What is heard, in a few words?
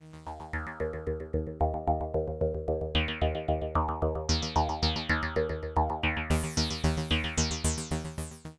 Vibe; greatkazoo